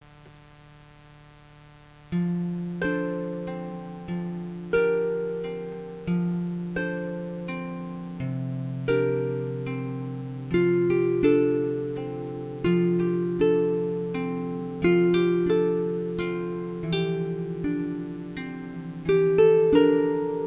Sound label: Lullaby